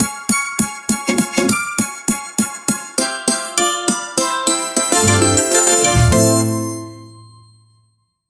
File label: final joyful theme